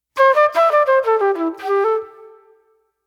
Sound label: Soul Plate Flute